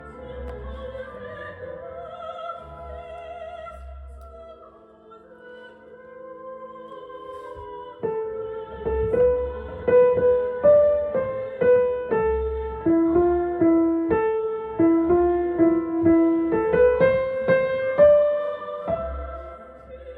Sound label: piano; Mendelssohn; Mouvement 3; Soprano 1